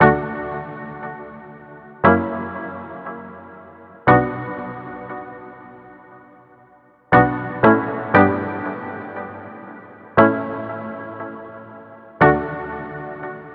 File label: D Major Prog